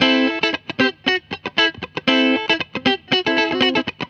GTR; USB Soundscan vol.32; Roots Disco; AKAI

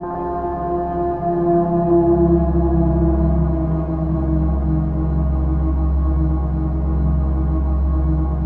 PAD, Dance Mega Synth

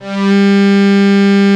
ME ST G3, ANA STR

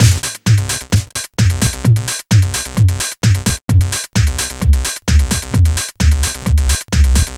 Tech Funk, Synchro Funk, Beats; Sonic Foundry (Sony Creative Software)

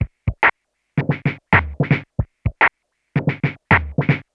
Juno Filtered Korg MR-16 (110BPM), beats; musicradar; cyborg beats

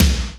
JD800 Dance Card Drums

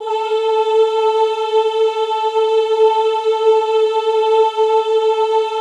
OOH A3, Classical Choir; Best Service; Extended Classical Choir; OOH MIX

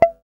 GUI MUTE, E-Gui Muted; Sound & Vision; Gigapack I CD 2

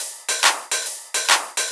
Shuffled Loops, 140 BPM

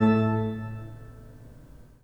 PIPE, 3DOrgans

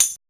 LINN TAMB, TAMBOURIN

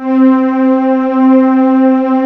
WARM STRINGS, D - 70; Rubber Chicken Software Sample Bank

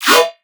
synth hit yoink robot E; Virtual Riot

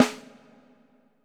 VERB, SNARES; Sampleheads - New York City Drumworks VOL-1

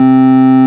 ORG, Farfisa Clarinet

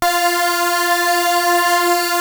Prophet 5 F5; Hollywood Edge - Giorgio Moroder Rare Synthesizer Collection

